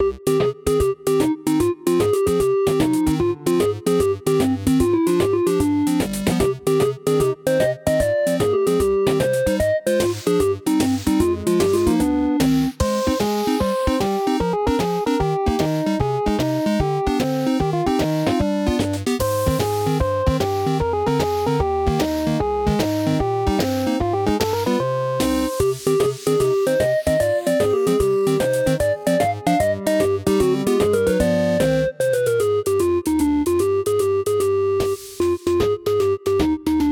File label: Tarantella 150bpm